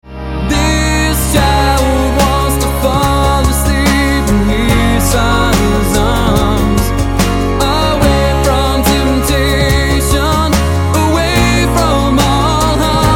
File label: Sweet Lullaby